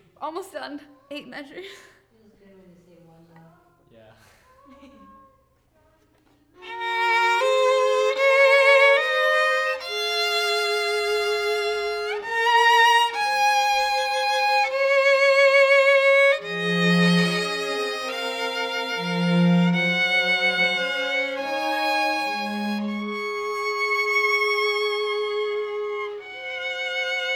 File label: violin